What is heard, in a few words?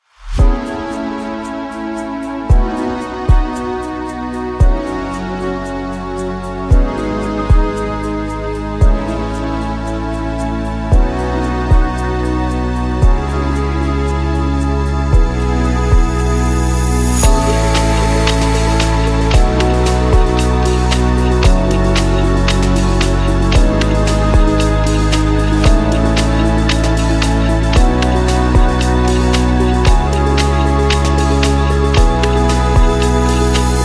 Calm Deep Ambient; ambience electronic music